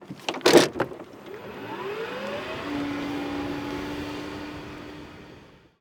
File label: golf cart stearing and moving away mono; soundscrate